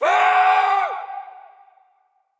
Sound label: death